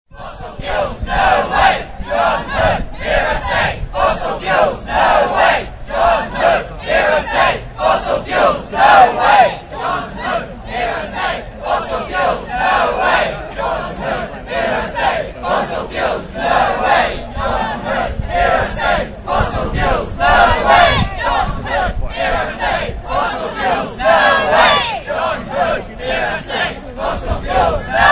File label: street chanting